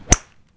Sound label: Golf